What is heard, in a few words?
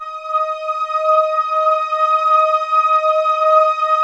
PROPHET VS, Vintage Keyboards; Big Fish Audio - First Call Vintage Keyboards